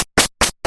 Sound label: flee; undertale